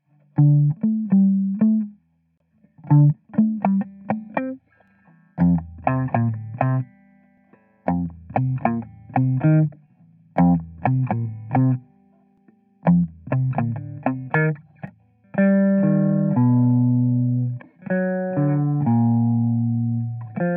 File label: Dark Church